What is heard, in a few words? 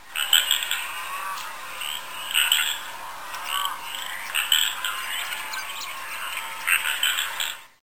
pacific tree frog